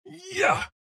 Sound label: Medium